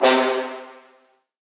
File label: Synth Shot C